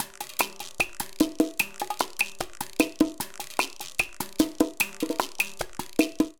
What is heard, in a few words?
Son Montuno 150bpm, Latin Percussion; Ableton; Factory Packs